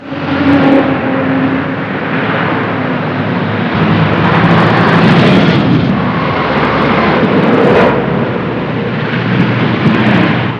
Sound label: car